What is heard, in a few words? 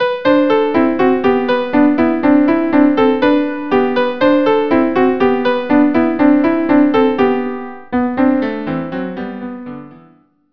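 pas de deux violin viola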